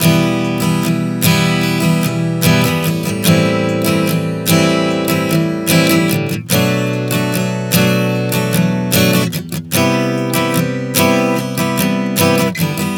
Progression 074 Em-Am-Bm-Cmaj7, Acoustic Guitar, Chord Progressions 074 bpm; Sonic Foundry; Songwriter Acoustic Guitar Companion